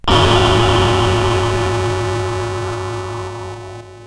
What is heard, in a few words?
Gong, CSG8580R5